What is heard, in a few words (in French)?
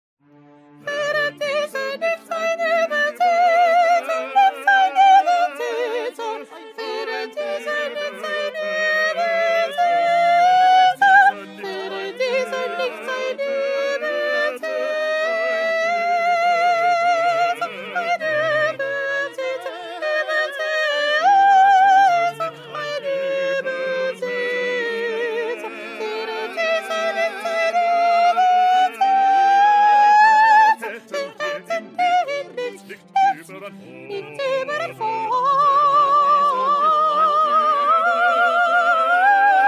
petit choeur sopranes